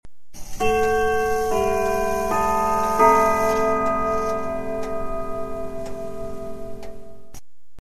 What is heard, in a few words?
Westminster 3 quarter hour